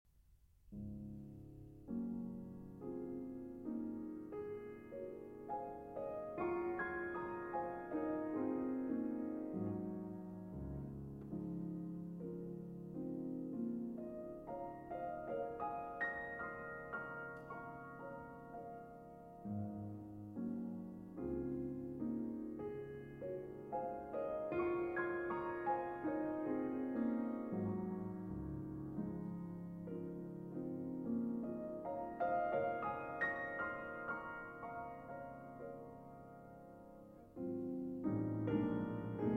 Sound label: Piano; Images Pour Piano, Livre I, L. 110 No. 1 Reflets Dans l'Eau